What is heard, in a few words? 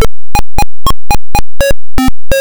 LEVEL START; Dangerous Dave